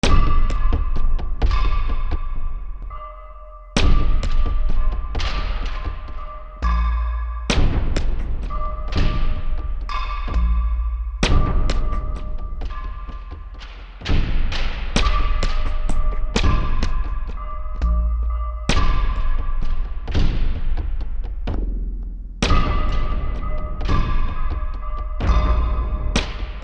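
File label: Battle Loop City Percussion; Fallout New Vegas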